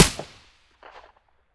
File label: aps silencer mono; STALKER; IWP